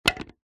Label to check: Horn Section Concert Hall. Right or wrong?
Wrong